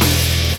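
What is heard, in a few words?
guitar (heavy hit); Sonic Foundry (Sony Creative Software); Ugly Remnants VOL-2; Single Shots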